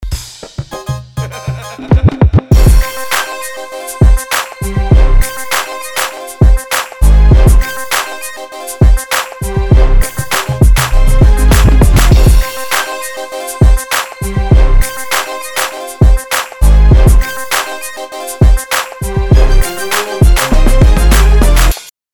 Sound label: beat; 2000s type beat